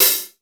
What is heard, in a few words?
sfx perc